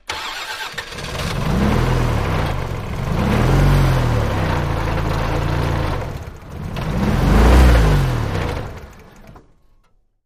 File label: Car; Starts, Idles With Very Heavy Rattles, Off, Front Perspective